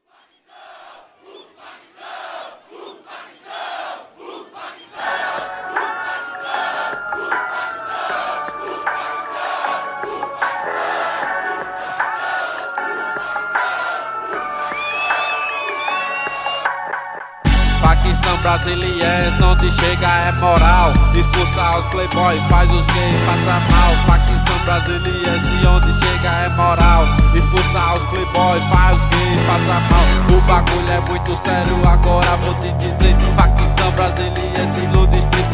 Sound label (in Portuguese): rap